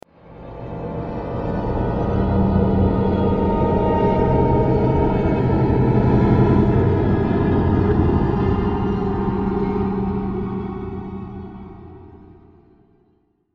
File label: Sweeper Flange Musical Harp; Blastwave; Production Element Imaging Element Accent Transition